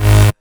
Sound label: Synth Shot; Vengeance Samples